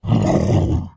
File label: kagouti critical injury; skywind